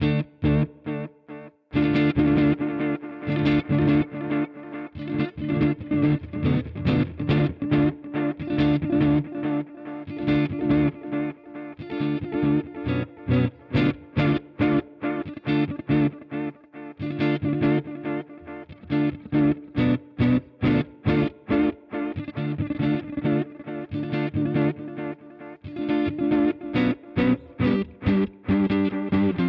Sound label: Crazy Tube Circuits Time MK 3